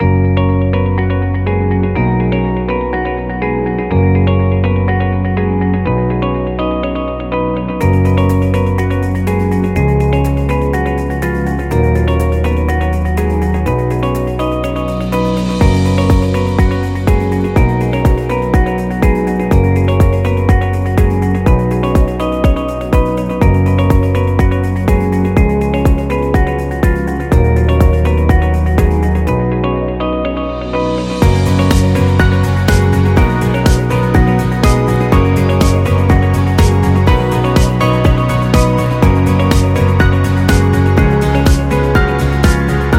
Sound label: This Corporate; hi tech